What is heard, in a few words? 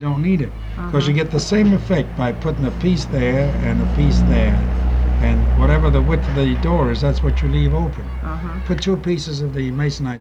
Construction sounds; Tony Schwartz Collection